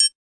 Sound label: Triangle